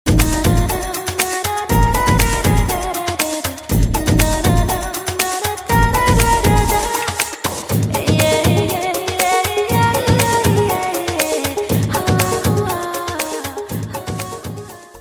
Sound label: South Music